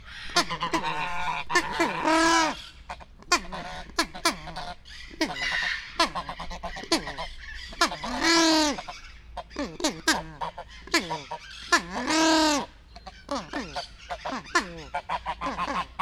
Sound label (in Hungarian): előtérben a magellán, magellán lúd; debreceni zoo 2019